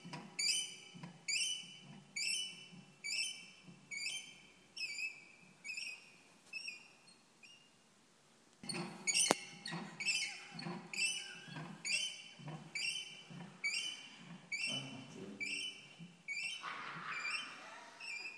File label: squeak and bong